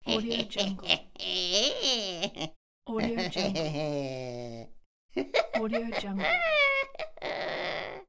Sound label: old witch cackle laugh